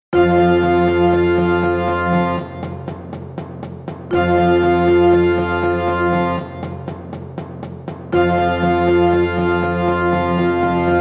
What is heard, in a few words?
Band Warmups Band Recording Lungs and ears of steel